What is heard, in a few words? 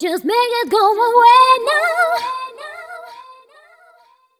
f mel f, fem Vocals; Mutekki Media; Diamond Vocals 2